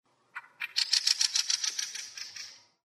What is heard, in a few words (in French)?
grive litorne